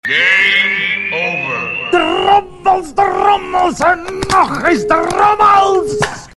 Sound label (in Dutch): Game Over en Drommels; Kermis Spel en Kramen